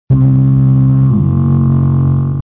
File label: fog horn